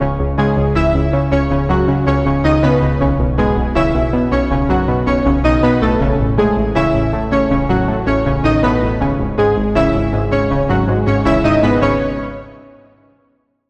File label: Lead Cosmic Strings; Routed Sound; Spire Expansion; Star Scavenger Vol.1